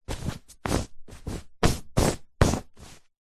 household bedsheet movement fast rubs hand flatten; zapsplat